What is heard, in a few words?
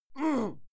male fight yell muted; menschen; schreie